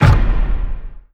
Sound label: chat close; ui